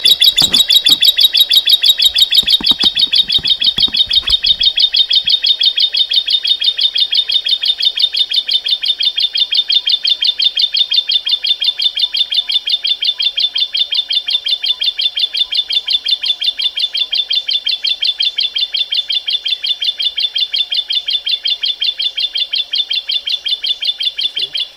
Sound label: banded prinia